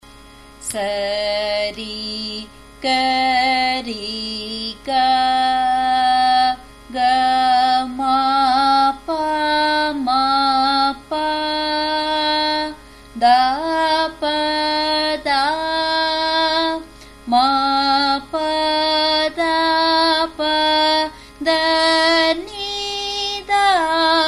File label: Sarali Varise G sharp, music; Carnatic Music